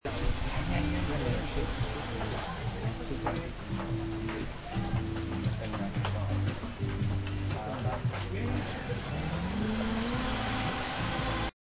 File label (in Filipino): tindahan ng shake